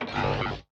spidertron inventory move; factorio 2.0.55

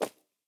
dirt, walking; factorio 2.0.55

